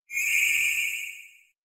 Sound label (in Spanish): Grito de Blipbug